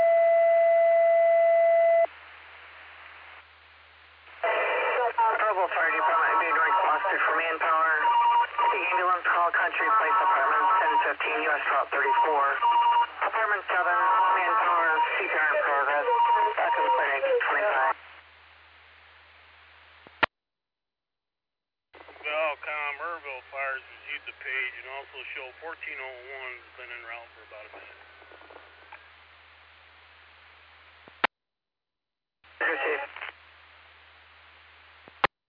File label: Fire; Earlville; TTDAlerts